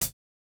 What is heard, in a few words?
hihat, house